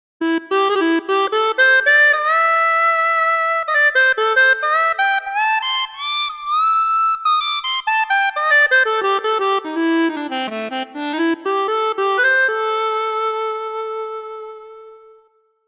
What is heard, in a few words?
Vintage Harmonica; Kontakt Wind Paks